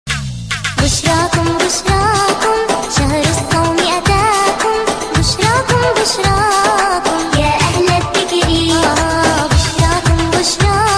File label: arabic ramadan ringtone